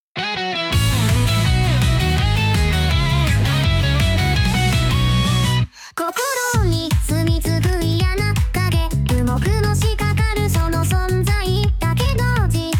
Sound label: vocalo pop